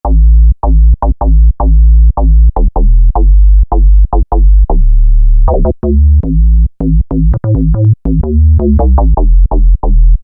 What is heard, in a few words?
Korg microKORG X Mod Bass; Factory Voices